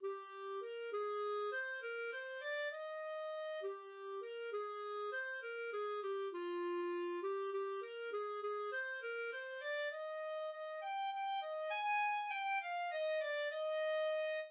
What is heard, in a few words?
humpty dumpty, clarinet